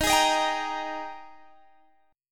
G sharp Major 7th Suspended 2nd

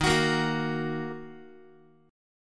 Eb Minor, Guitar; Ebm strummed; 4A,4E,5A,5D,5G#,6C#; x,11,9,8,10,x; JGuitar

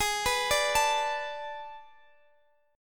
A Major 7th Suspended 2nd Suspended 4th

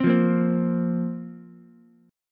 Bb Suspended 2nd Bb Ukulele; Bbsus2 strummed; JGuitar

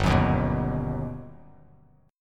G Augmented Major 7th, Bass Standard; G+M7 strummed; JGuitar

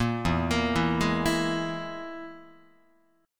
E Major Flat 5th, Guitar; arpeggiated; JGuitar

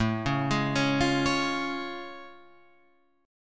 A Augmented Major 7th A Guitar Open F; arpeggiated

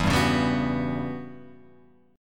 E Minor 6th Add 9th chord

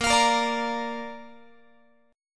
A sharp Major, Guitar; strummed; tuning 4A,4E,5A,5D,5G#,6C#

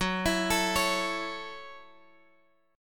F sharp Minor 7th F sharp, Guitar Drop D

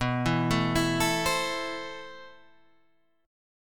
A Minor B, guitar; Am/B

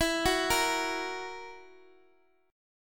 E Suspended 2nd Flat 5th E, Guitar, Drop D; x,x,x,9,7,6; down

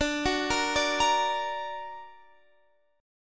D Augmented D down; D+ arpeggiated

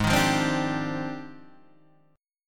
F Minor 13th, G sharp

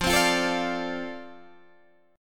F 6th F down Guitar Open D

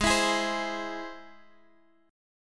Ab Augmented Ab Guitar Standard; strummed

